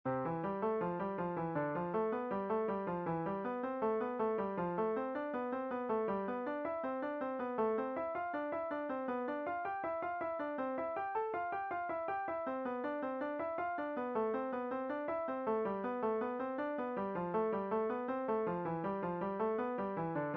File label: Five finger stretching, piano